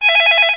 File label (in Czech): telefon